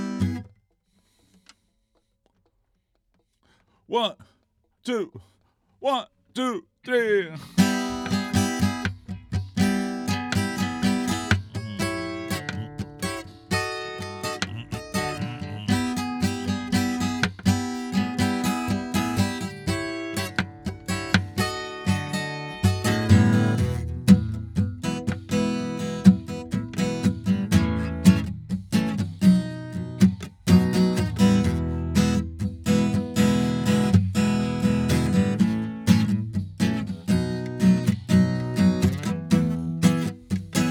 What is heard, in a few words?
Acoustic